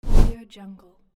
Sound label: screen swoosh